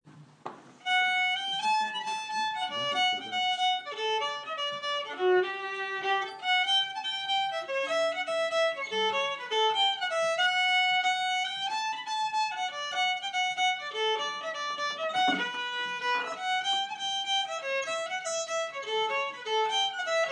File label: Jig